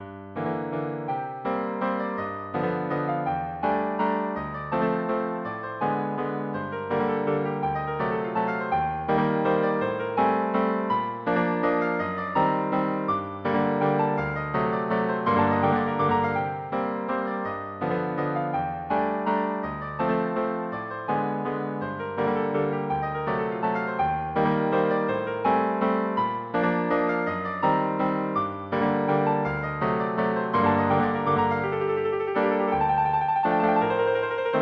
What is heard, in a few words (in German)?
Walzer g-Moll; Op.28 N°4